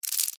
Cockroach Scurry, Animal, Cockroach, Scurry, Short, Movement, Insect, Bug; Cricket; Blast Wave